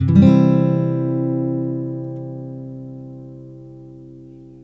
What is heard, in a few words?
C strum chord mono